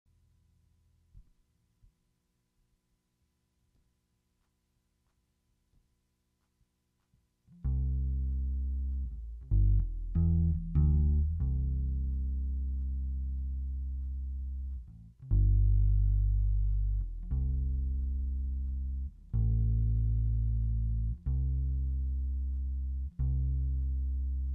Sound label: French Waltz Guitar